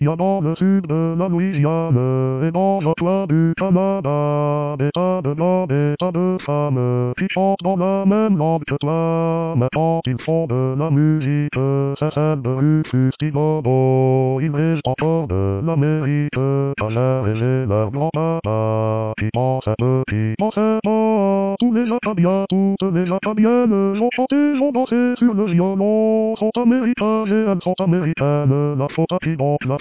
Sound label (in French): Basse